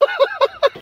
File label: laugh demon; ooh ahh